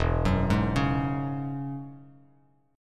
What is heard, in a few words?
E 6th Add 9th E down Bass Standard; E6add9 arpeggiated; JGuitar